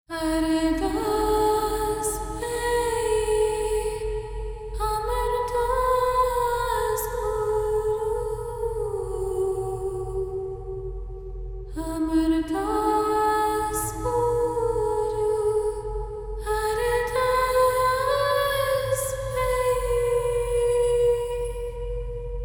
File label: Ardas Choir